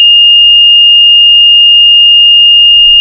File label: tone